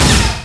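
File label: tank attack; quake2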